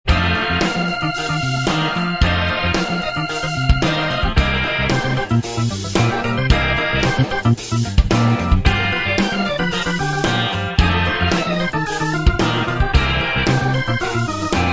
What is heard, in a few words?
Funky Blues